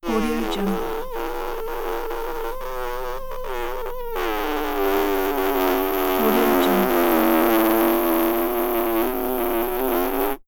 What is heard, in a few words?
fart